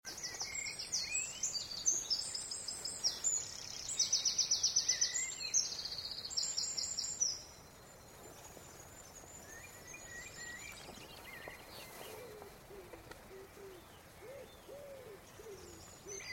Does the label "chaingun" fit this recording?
No